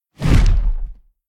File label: swing earthy; Smite; Cabrakan